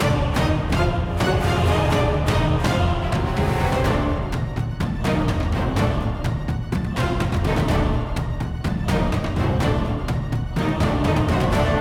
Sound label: game Bgm; b23 zuma